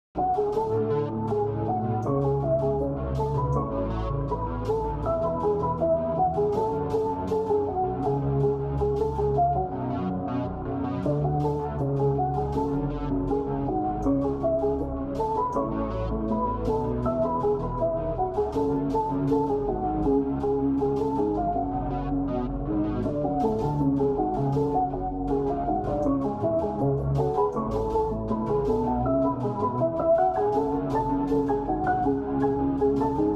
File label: chill, relaxing music